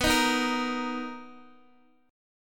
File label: B Major 7th B down Guitar DADGAD